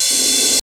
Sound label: RVRS, Grunge Hip Hop; USB Soundscan vol.34; AKAI; RNB 101